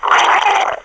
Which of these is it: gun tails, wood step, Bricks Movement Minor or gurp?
gurp